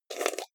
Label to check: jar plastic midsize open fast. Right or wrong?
Right